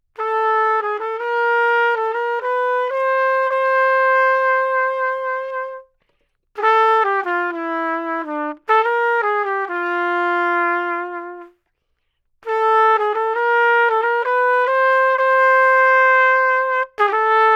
TRUMPET TUBE

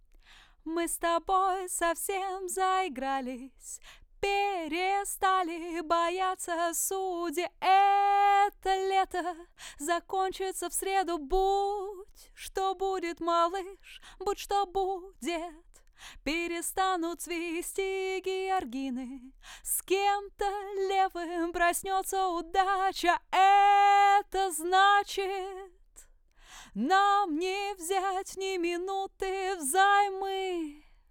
Telefunken C12

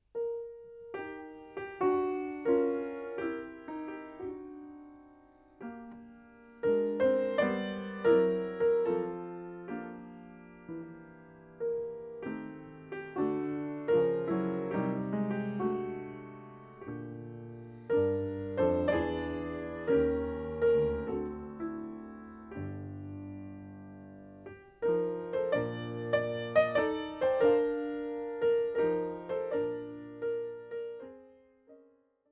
PIANO SOFT

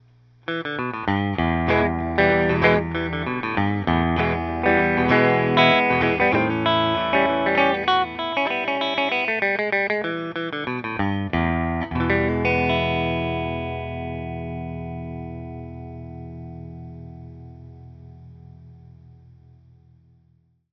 EL GUITAR FET

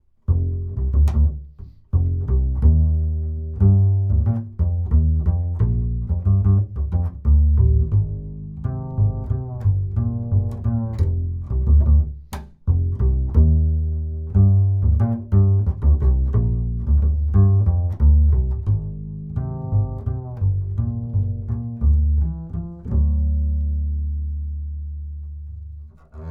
ACC BASS BOMBLET

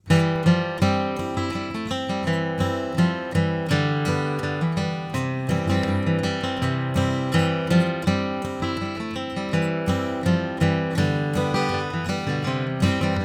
ACC GUITAR; TUBE

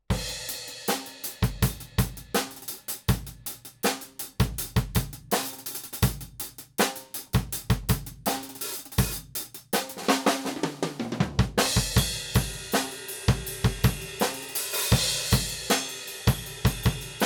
Drums

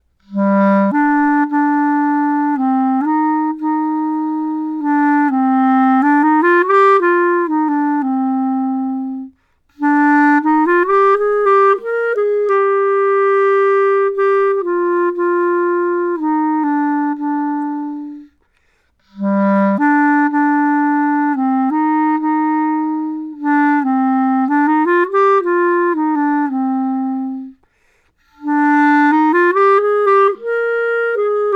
CLARINET; FET

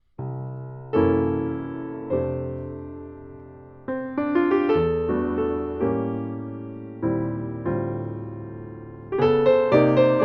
PIANO FET

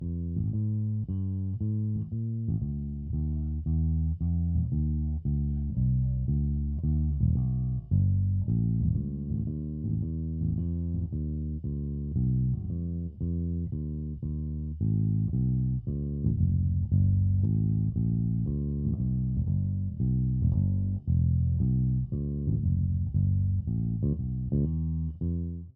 Bass TLM 103

